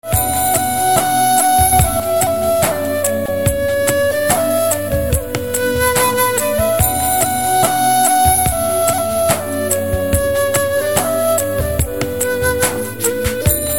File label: tone, instrumental